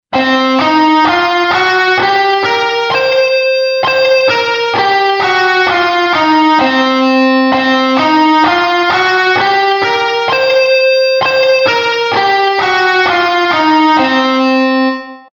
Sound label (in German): C Blues Tonleiter